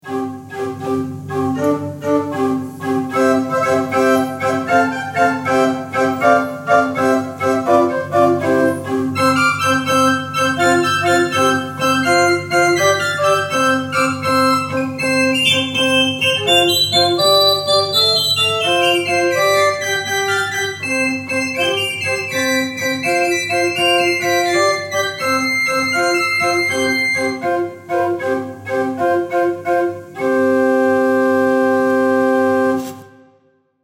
pipe organs; Oehms

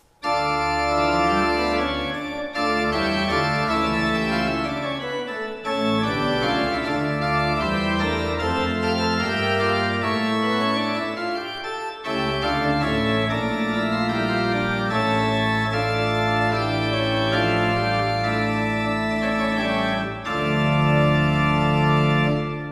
Ott, pipeorgans

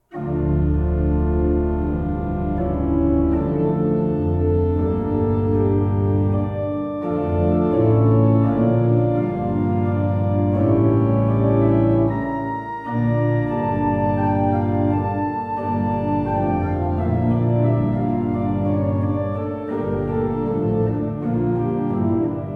pipe organ; Schuke Potsdam 25 II P

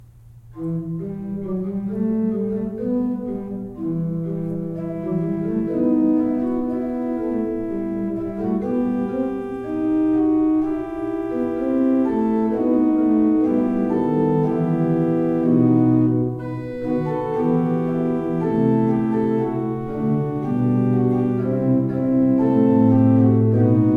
pipe organs; Breil 19 II P

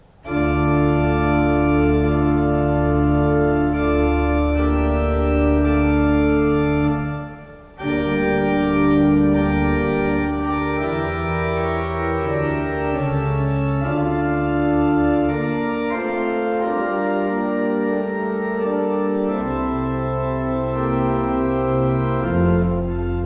Wordsworth, pipe organ

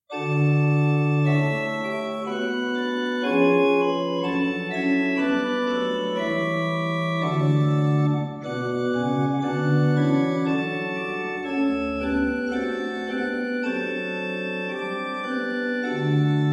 Prengel, pipe organ

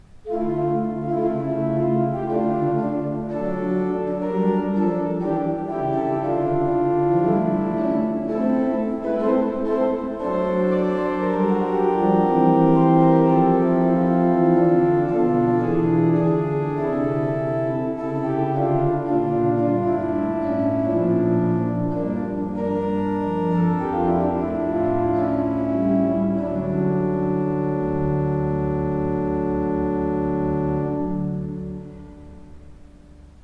pipe organs

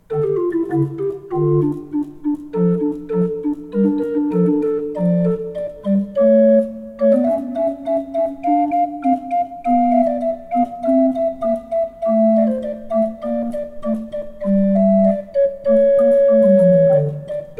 Schmid, pipe organ

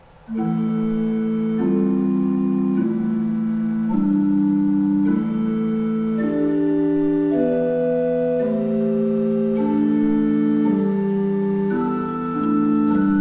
Ott, pipeorgans

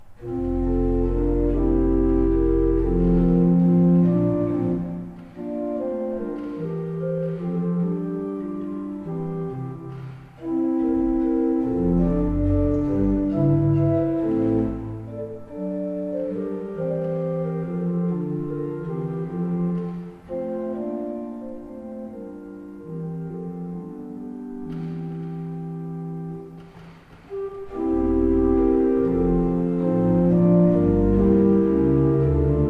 Fleiter, pipeorgans

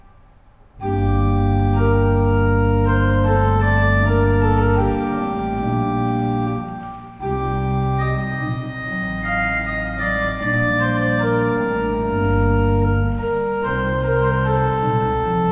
Fleiter, pipeorgans

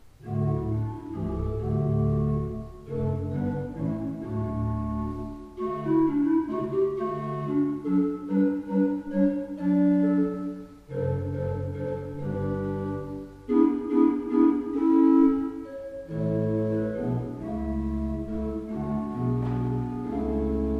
Ott, pipeorgans

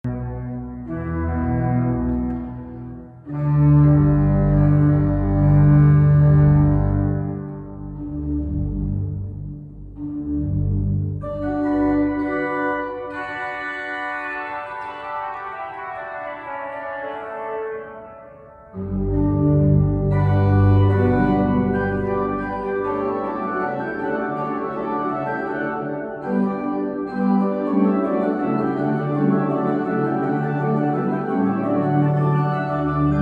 pipe organs

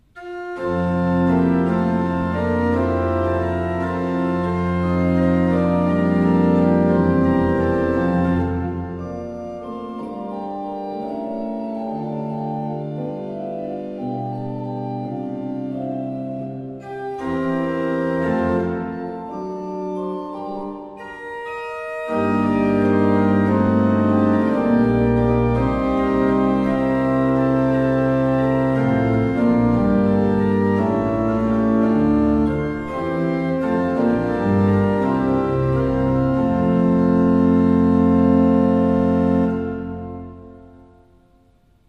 Fuehrer, pipe organ

